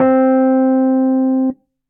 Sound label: Basic Suitcase Speakers, Suitcase Piano; Ableton; Factory Packs; Electric Keyboards